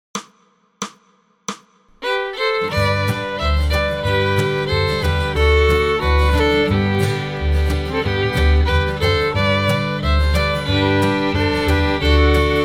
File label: Wildwood Flower G 180 Fiddle 1 and 2